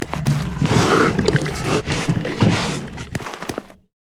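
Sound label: cougar emote taunt; nidalee; nidalee base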